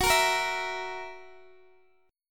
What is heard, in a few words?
F sharp 7th F sharp Guitar Open D